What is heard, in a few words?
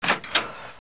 opens lab door; Elaina; DR. BRAIN